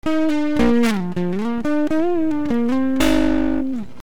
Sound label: light distortion